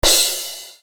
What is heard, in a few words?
Hat, EuroDance